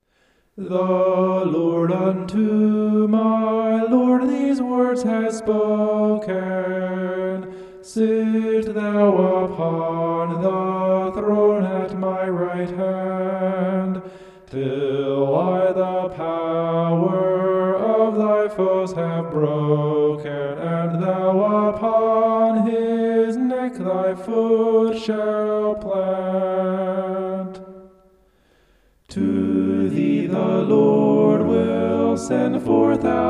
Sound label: vocal homoph; psalm 110